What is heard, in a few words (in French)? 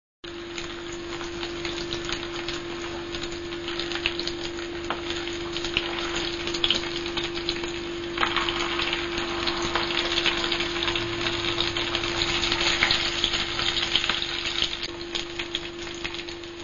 ambiance friture cuisine